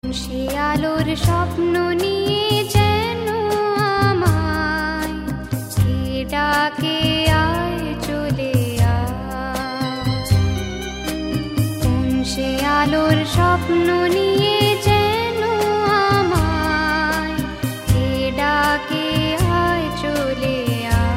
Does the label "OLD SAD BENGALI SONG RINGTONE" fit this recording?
Yes